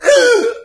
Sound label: wolf; cstrike